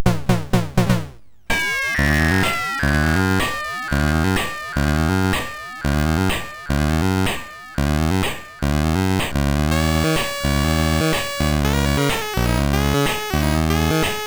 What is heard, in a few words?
Sax, MOS6581R4